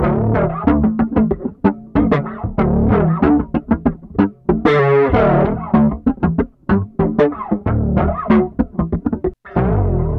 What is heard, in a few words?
D, Bass, 94 BPM; Sampleheads; Ultra Freakin' Bass